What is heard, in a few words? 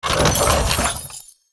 Master Chest Play